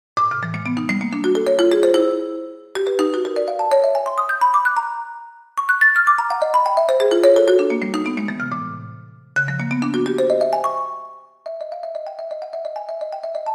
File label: Toccata